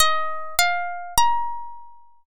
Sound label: koto